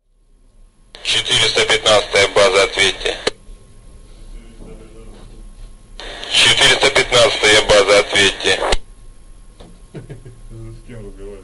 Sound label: Russian Police